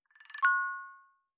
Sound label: Alert Passbook Geofence Salient Haptic; i OS; iOS10; nano